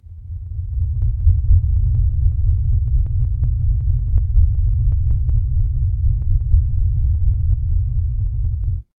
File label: horror heartbeat